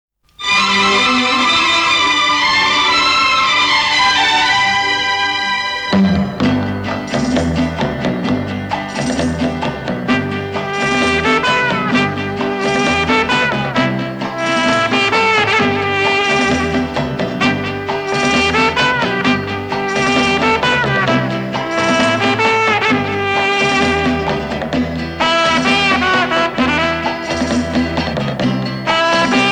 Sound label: Silver Trumpet